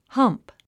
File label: hump